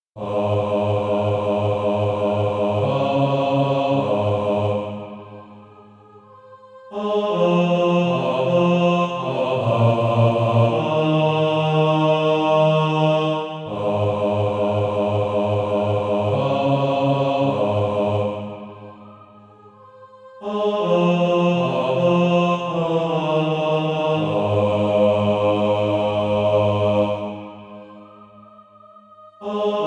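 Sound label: bass, Practise Music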